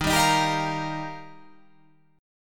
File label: D sharp Major Add 9th